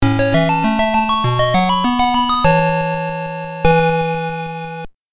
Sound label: Congratulations!; Madou Monogatari II Aruru 16 Sai